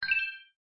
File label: select box flash